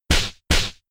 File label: Slap; Double Slap 1hit; attack moves; Pokemon; Gen 5; Blk Wht Blk2 Wht2